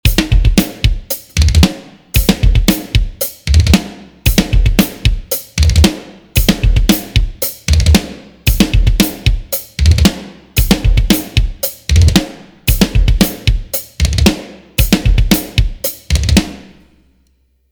Protest Beat Hi Hat; Unity of Noise

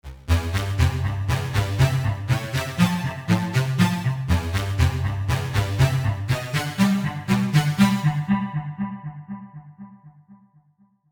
Cello of Echos Chord

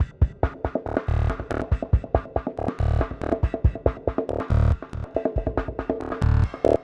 Amen FX Break

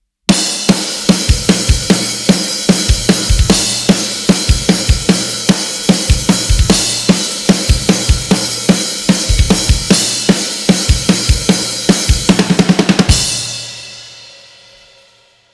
Snare Slammer Mix; Send It

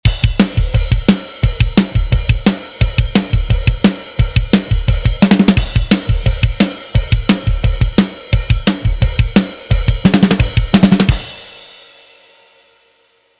Tripple Kick Beat 174bpm; Unity of Noise

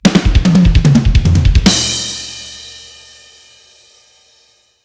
Double Drums Fill Mix

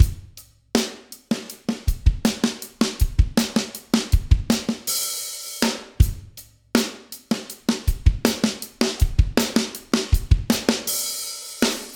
Break Piccolo Tight Hat No Room; Emerald Break

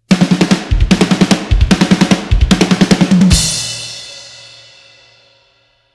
Power Fill; Down South

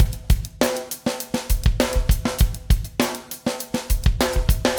Funky Break Pig Snare Open Hat

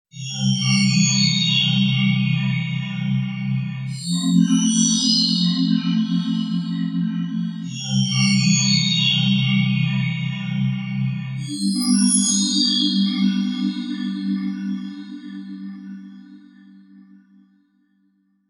Alien Calling Chord Example

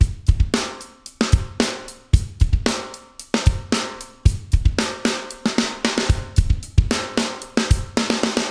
Piccolo Open Hat No Room; Chocolate Break

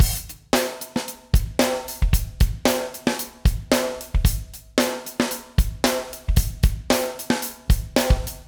Break Hat Pig Snare Open; Alien Break

